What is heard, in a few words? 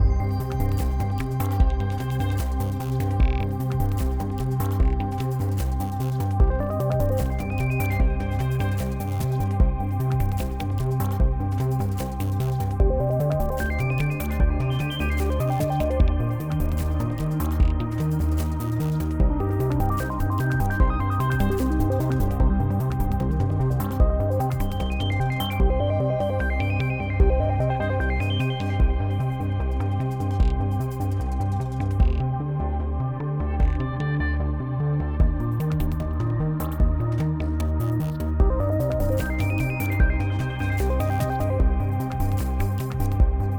Puzzle 8CH 75BPM; Benefactor